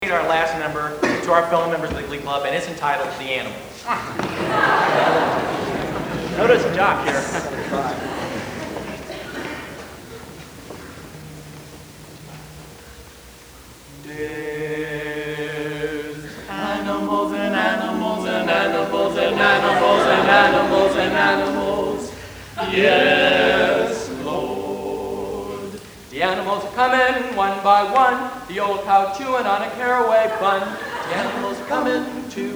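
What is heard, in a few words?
Mens Quartet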